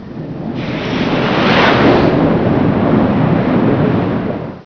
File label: fly by